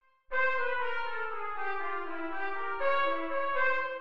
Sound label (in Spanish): Dominante en C